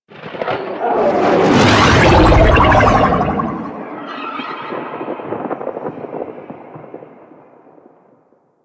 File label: Insect Liset Fly By Slow, Vehicles; Liset Flight